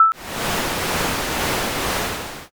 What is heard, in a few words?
aircon